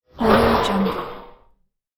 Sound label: robot movement